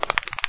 Knuckles Crack

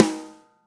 Snare

